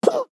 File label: player hit; fallguys